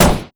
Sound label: Skin Metal, Damage